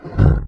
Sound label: monster death